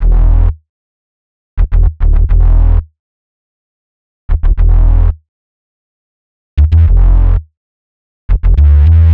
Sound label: HipHop Synthbass Gmin, Hip Hop Bass Synthbass loop; Digidesign; Pro Tools Essential Loops